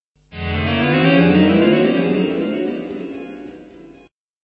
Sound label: slide; an undistorted slide across the frets